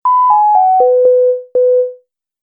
Multimedia Music; Miscellaneous Musical Sound Effects

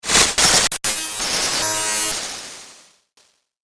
Laser Glitch Heaven; Laser Sound; science fiction sound effect

